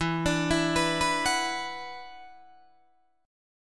E 7th Suspended 2nd Guitar DADGAD; E7sus2; arpeggiated

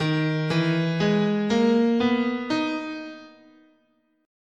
Eb Japanese, scale

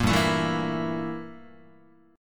G Minor 6th Add 9th G down Guitar; Gm6add9 strummed; JGuitar

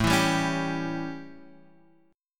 A 7th Guitar Drop D, chord

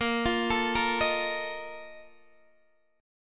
A sharp Major 7th Suspended 4th, Guitar, Open D

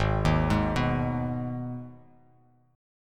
G sharp Minor, chord; 4,6,6,4,x,x,x,x

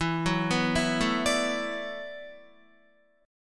E 7th Suspended 2nd Suspended 4th, down, Guitar; E7sus2sus4 arpeggiated

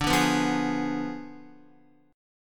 D Diminished 7th D down Guitar; strummed

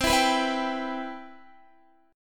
C Major 7th, Guitar

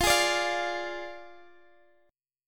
F Minor 6th F down Guitar Open G

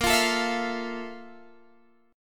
F Major 7th Bb; Fmaj7/Bb; strummed

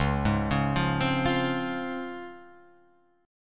Db Major 7th Db down Guitar Open F; arpeggiated

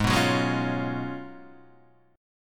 Ab Minor 13th, Ab, Guitar, Standard; Abm13 strummed; JGuitar

